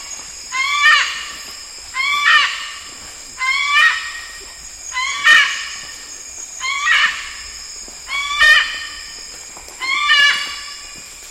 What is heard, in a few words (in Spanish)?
Cacatua alba